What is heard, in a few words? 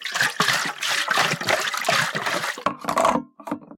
flamethrower reload; Original TK Sounds